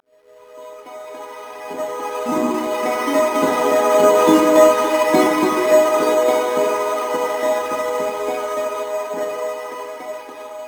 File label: melodies; sona; paxsona